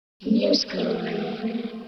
HOUSE EXTRA 125

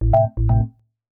DEEPHOUSE ORGAN 125 A

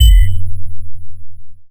TECHNO FX 140

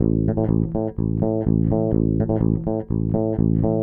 DEEPHOUSE BASS 125 A

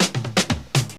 BREAK 116, drum; BREAKS

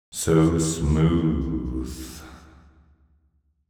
deep house male, 125, A, vocals

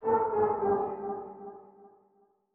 ethno, 125, A

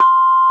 KENONG; ETHNICSTUFF

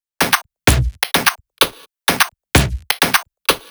drum loop 128 Perc; Electro; drum loops; Anthemic

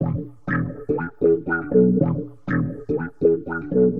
Warped Bass, 120 E; Loops; Driil It Down; Touch Loops; CosmicFunk

